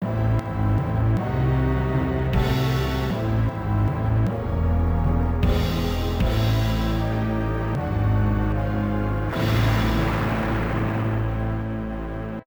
Stage Clear; King of the Monsters; arcade; Polluted Zone

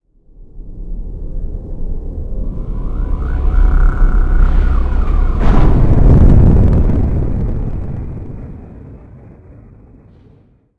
Launch Engine; sid meier s civilization revolution 2 2015 vita

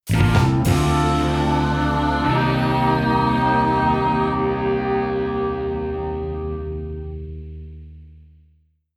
Gameover; Equality

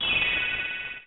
Coin; snow bros. 3 magical adventure toaplan gamerip arcade

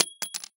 Key Hit; hello neighbor pre-alpha alpha 1

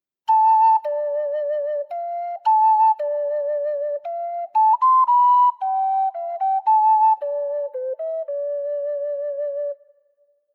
Ocarina

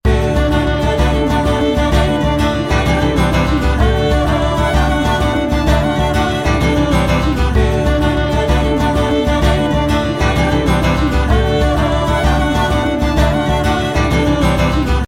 Tradition irish 5 Alltogether; Adventure Xpress

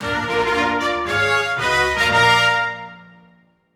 Result Win; Luigi's Mansion 3

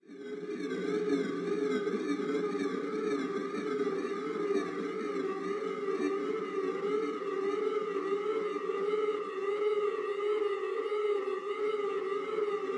BGM Amb space; game builder garage 2021 switch; Node

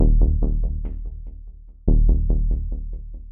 Convoyeur Open Door; Remember Me

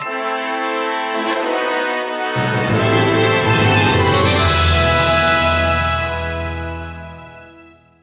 Clocktower Win Theme; epic mickey wii gamerip 2010

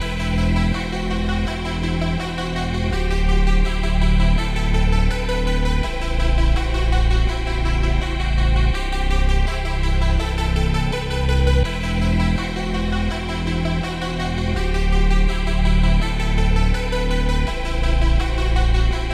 Dialog Theme; modern combat rebel guns; android; mobile

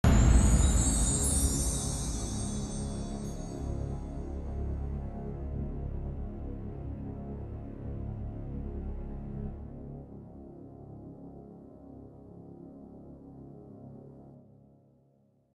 Inner Torment Bone Drone End; hulk gc ps2 xbox gamerip 2003

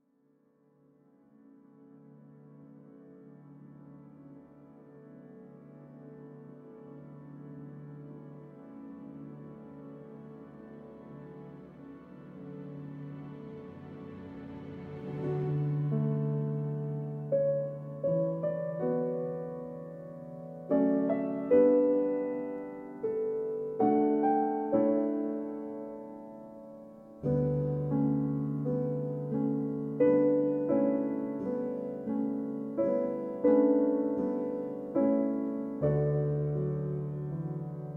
World Music

